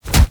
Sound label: Charge Body Impact; Warframe; Purgatory Warrior